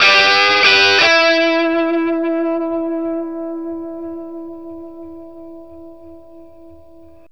BLUESY E 60, Vintage Blues Guitar; USB Soundscan vol.22

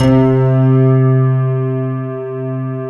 PNO HEAVN; EDENPNO

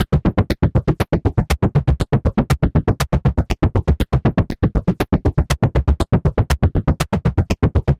Anabeat Mix, Beats

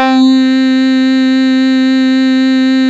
Twang C5, Fairlight CMI IIe Keyboards; Keyboards2

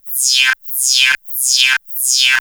Classic FX; radiosonics; Classic Simple OSC FX

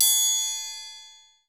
LG TRIANGL; ORCH.COMP

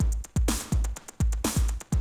125, Classic Drum Loops

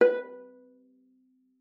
B4, VIOLA PIZZ; Xsample VOL-5; 90 s Sample CDs

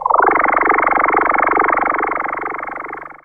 EFFECT, Explosive Jungle

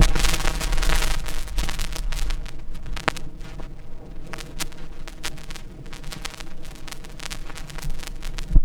FX SWEEP; SYNTH FX; SYNTH SWEEPS

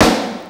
Snare, Fairlight CMI III

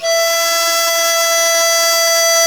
qu di E5, flute; Spectrasonics; Heart of Asia

